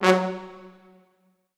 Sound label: F#2 R, Orchestral Brass; Best Service ProSamples vol.20; AKAI